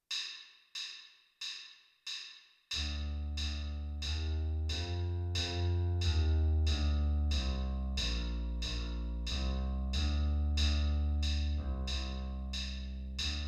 electric bass

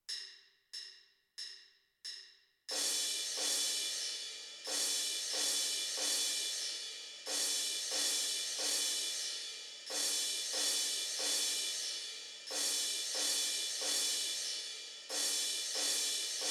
auxiliary percussion

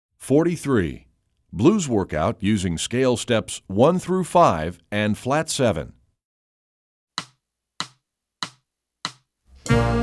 blues workout scale steps

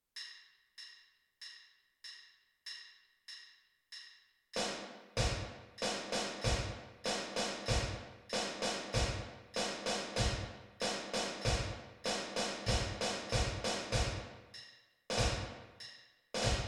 bingo snare drum bass drum

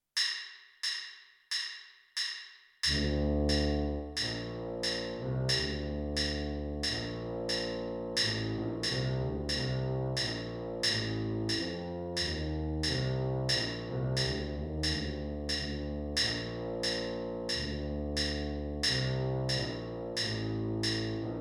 tuba part b

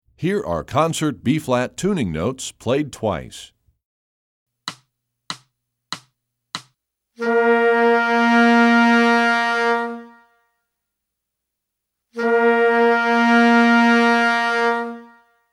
building the blues scale

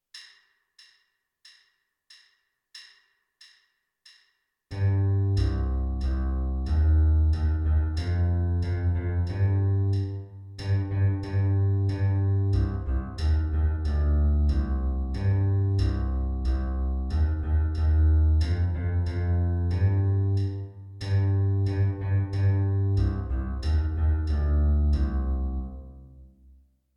electric bass

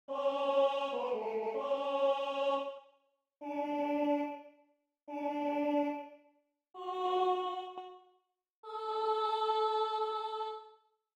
alto

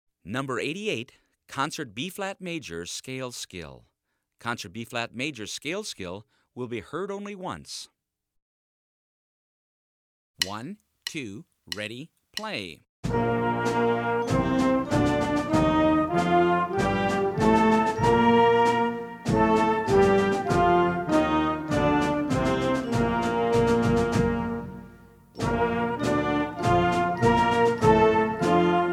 major scale

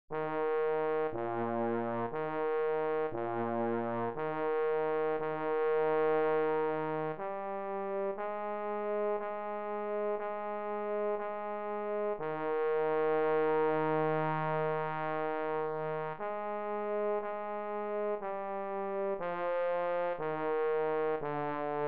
trombone and baritone chorale and recessional

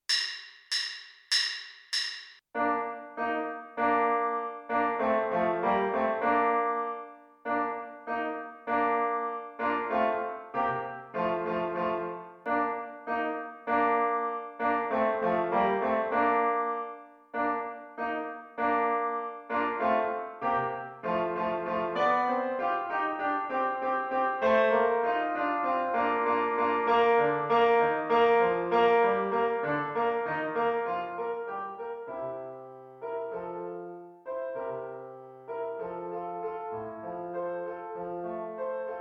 finale for bass clarinet piano only

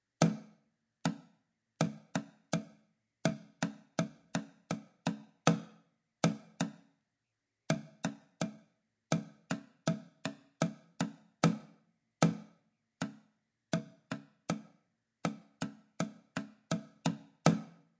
drumming; level 2 yellow belt

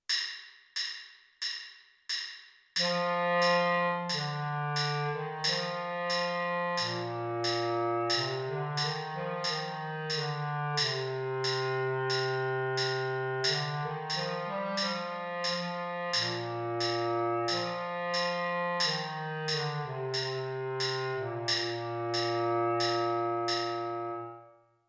bass clarinet

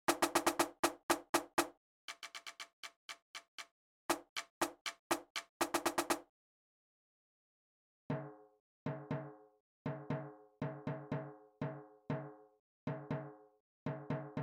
snare drum

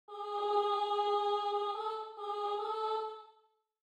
alto

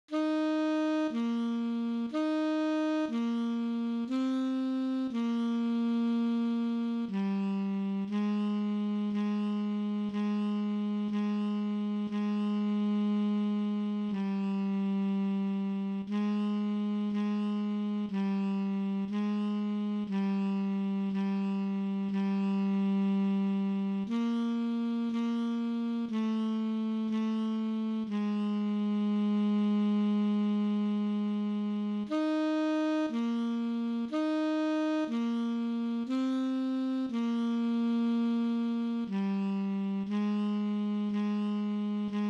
alto sax chorale and recessional

